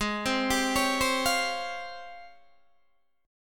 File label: G sharp Major 13th, Guitar, DADGAD; 18,15,17,18,15,15